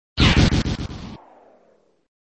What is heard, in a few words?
Sound SFX, flipper